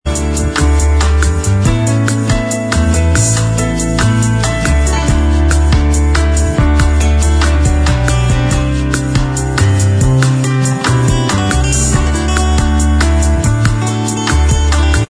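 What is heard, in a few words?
bossa version instrumental track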